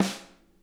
SNARE, Interactive Drum Kits; Sonic Reality

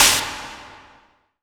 IMP SNR DRUM, Drum, Cinematic Impact

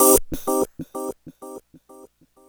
Whisper Echos; Dr. Fink's Funk Factory

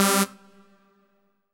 RAVE, RAVESYN

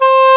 ENGLISH HORN; East West; Global Sounds

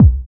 DR. LOOP, DRUMS; X-Static Goldmine 3

